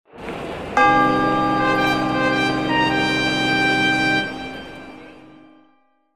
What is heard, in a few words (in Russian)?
kolokol